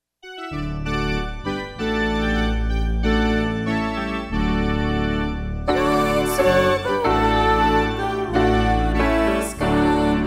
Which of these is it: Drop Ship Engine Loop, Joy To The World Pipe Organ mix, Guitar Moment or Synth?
Joy To The World Pipe Organ mix